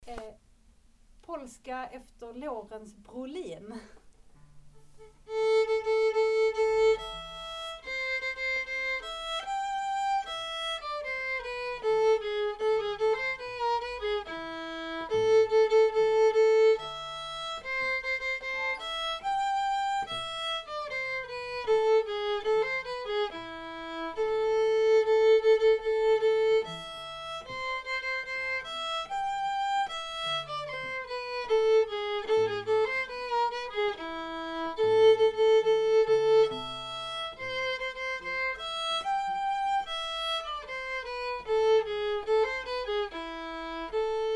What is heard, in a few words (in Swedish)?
Polska